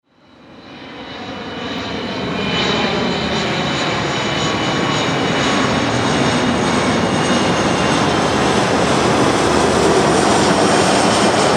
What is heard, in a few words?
Warfare Jet KC135 Pass By Fly; Airplanes; Vehicles